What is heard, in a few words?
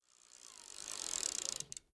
Bike Chain; Fairlight Sound Lib